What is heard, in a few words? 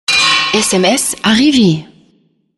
sms courte